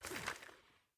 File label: weapon holster; weapons